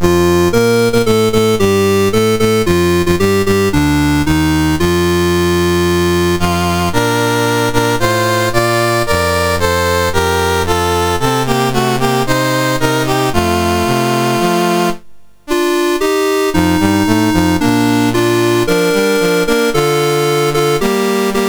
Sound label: National Anthems, MOS6581R4; Slovenia; Dave Marquis